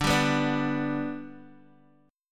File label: D Suspended 6th D, guitar